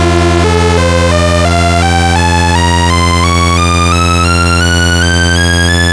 Sync Additive; Ableton Live 12 Lite; Vector; Sprites

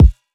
Kick

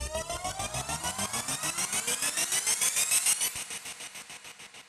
Riser Synth; One Shots; FX; Ableton Live 12 Lite; Core Library

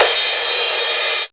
Crash, Cymbal, Drums; One Shots; Bandicute; Ableton Live 12 Lite; Core Library

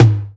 Tom MPC; Drums

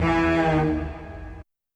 Stab Strings Bendy; One Shots; Ableton Live 12 Lite; Core Library

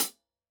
Hihat Closed Tight Side

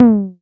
Tom MKS, Drums

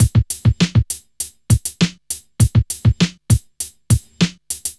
100 beat; Pro Tracks

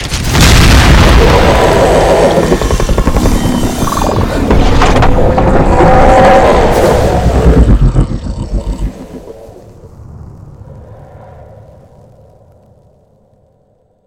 Activation Room Surprise; Cave; Journey

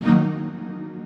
Stab Strings Phased; One Shots; Ableton Live 12 Lite; Core Library

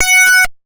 Sandblaster F#4, synths; Multisamples; Ableton Live 12 Lite; Core Library

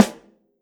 Snare Stick Hit Hard Dry Session, drums

